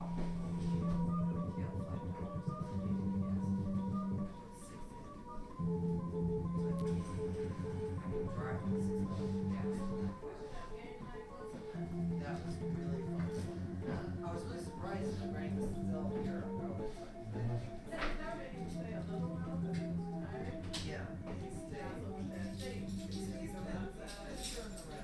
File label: Brother Playing With Organ